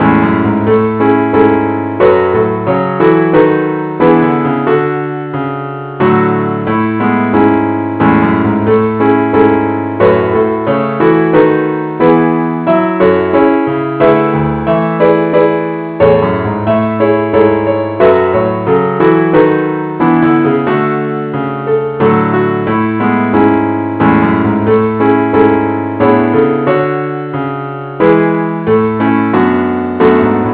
keyboard only key A, A Major

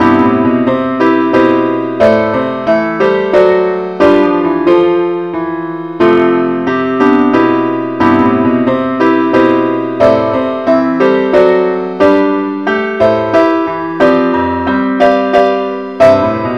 keyboard only key C; Amazing Grace; John Newton

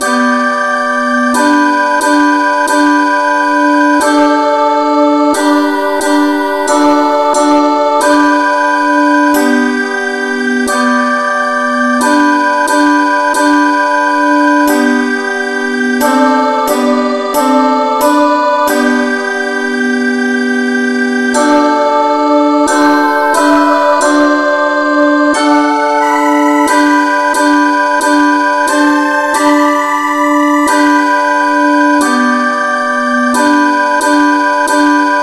no vocal key g; henley

